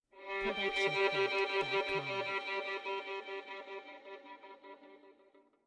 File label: Violin Octave Flutters